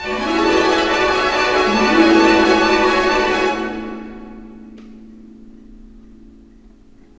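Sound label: 128, orchestra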